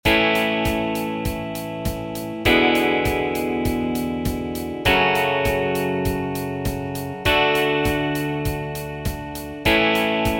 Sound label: Country No Bass; Tryout track; 2027 MMEA conference All-State Collective Ensemble audition